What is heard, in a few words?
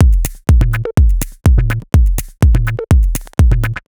A 124bpm, House Drumloops; Zero-G House Fabrik Drumloops